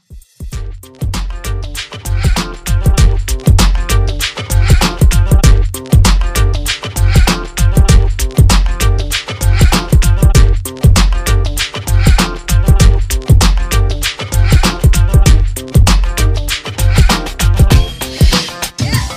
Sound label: Reggae Redrum